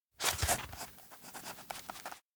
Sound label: note write; rikka; anemoi